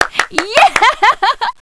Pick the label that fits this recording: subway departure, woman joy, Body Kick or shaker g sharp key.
woman joy